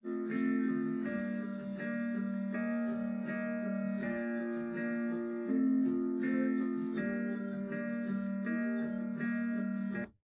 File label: drums; SiSEC11; underdetermined